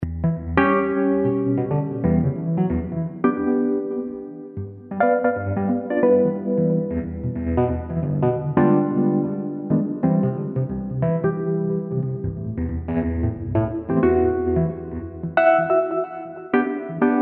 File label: Electric Phaser Ballad; EZKeys Electric200A